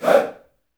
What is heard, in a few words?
Chant